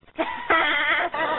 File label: laugh; janice